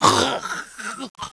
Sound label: cut, player male